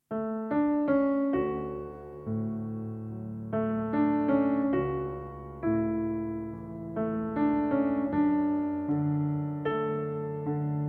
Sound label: Acoustic